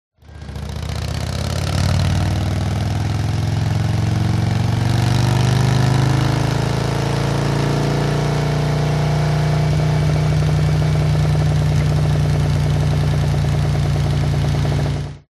TRANSPORTATION MOTORCYCLE Harley Accelerate Decelerate